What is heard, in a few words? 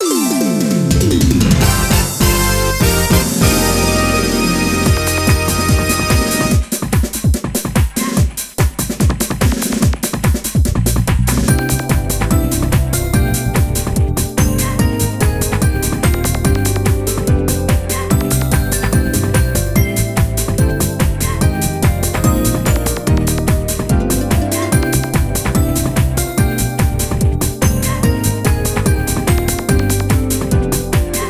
Race BGM; animastar 2000 dreamcast gamerip